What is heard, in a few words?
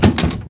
effect locked